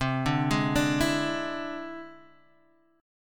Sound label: E Augmented 7th C, guitar